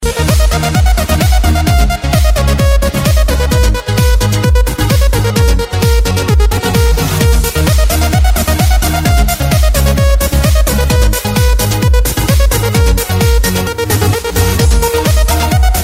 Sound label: techno